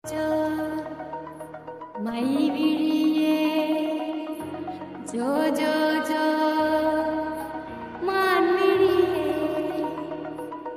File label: Tamil Song; Aranmanai 4